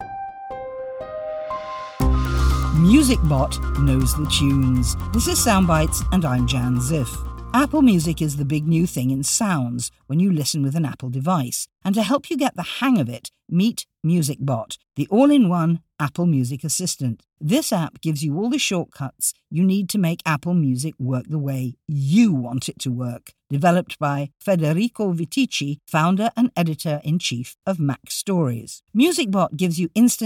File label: music bot